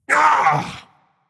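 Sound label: non verbal ouch, voice; cernunnos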